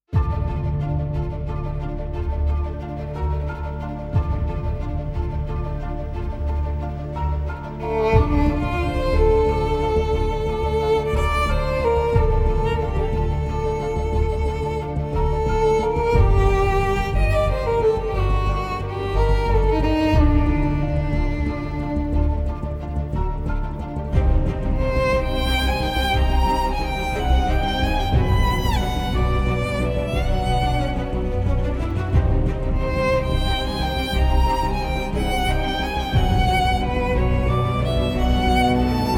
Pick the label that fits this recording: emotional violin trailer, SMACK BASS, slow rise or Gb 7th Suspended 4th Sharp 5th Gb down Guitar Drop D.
emotional violin trailer